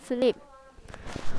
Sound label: bottle